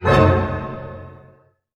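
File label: F# diminished pianissimo, orchestra hits; Sound On Sound - Peter Siedleczek's Orchestra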